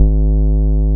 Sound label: BAS MKS-50, Roland